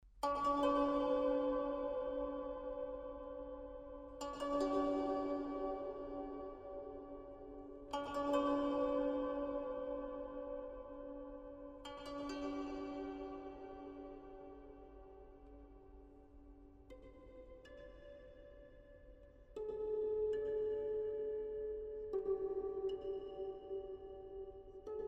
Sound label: interlude